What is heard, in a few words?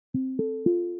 sent